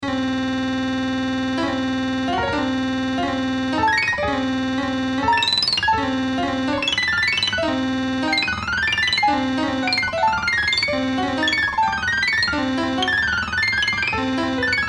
AIA 193 full image sonified raster, sonified solar data